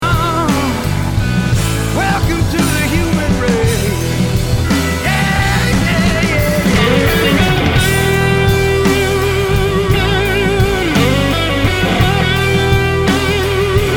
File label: Blues solo